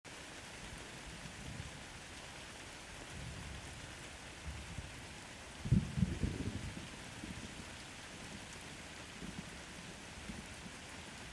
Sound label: thunderstorm